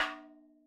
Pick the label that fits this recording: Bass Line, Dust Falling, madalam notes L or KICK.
madalam notes L